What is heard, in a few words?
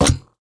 kids game chest thunk twang pluck hit hybrid sci fi mech